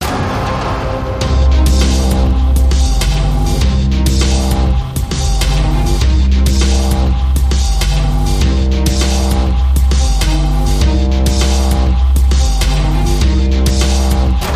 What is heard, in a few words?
Talking Grunge